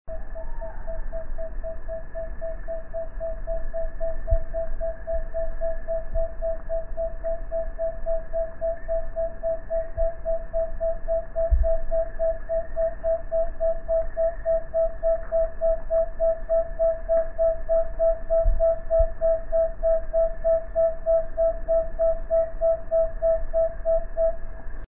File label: megascops watsonii